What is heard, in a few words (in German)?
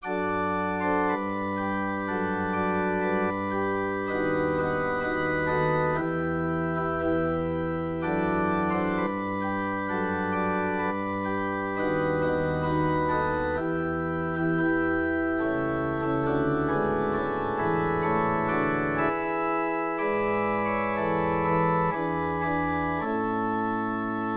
Akrostichenchoral